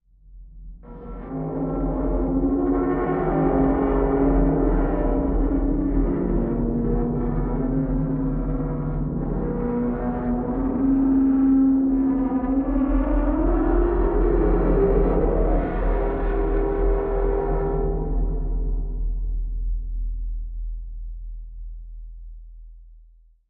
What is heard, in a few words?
Underwater Metal Str, metal groan atmospheres; Underwater Metal Stress Ver 3